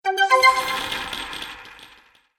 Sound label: Game Gold Treasure Bonus, Video Game Sound Effects